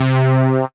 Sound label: SYN, Synths; M8; Electronisounds